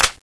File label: m4a1 clip out; DarkKnight